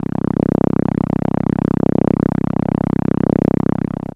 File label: Contra C1, ARP Solina, contra bass; musicradar-synth-samples